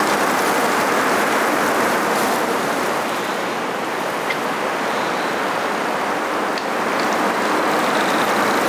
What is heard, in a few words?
Forest